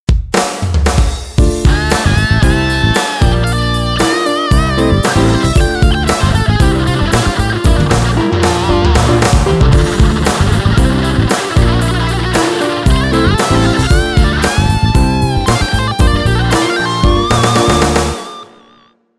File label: gt solo tap